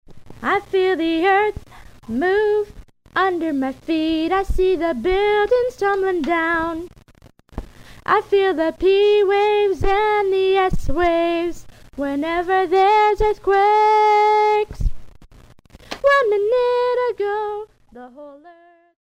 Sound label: Earthquake; Earth Science; Student contest; Earth Science, Grades 7-9